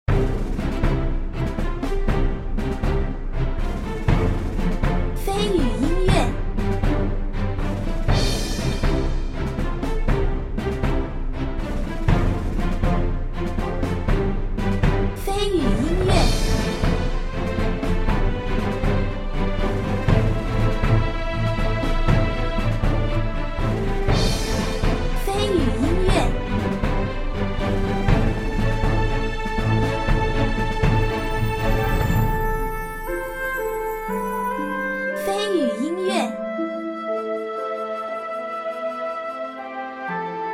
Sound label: port day